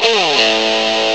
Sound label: fuzz; GUITARS1